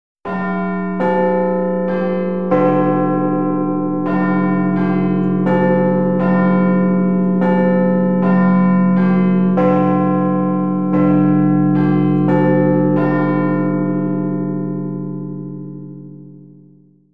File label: BigBen; NapaDos